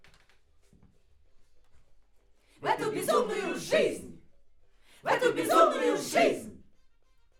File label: Backs AKG LEFT